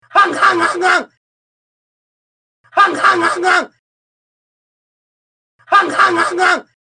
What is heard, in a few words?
Gun